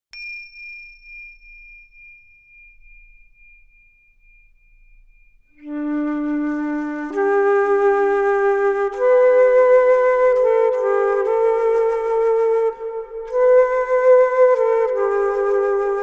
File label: Tibetan Meditation Music